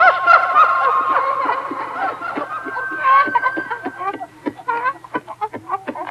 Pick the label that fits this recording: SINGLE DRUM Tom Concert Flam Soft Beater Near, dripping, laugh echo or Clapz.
laugh echo